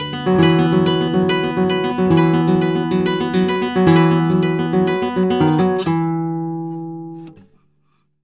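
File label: arpeggio guitar, Flamenco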